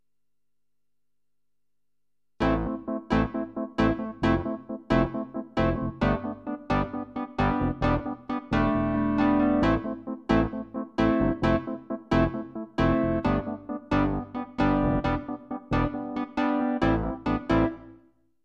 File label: Stereo Wurly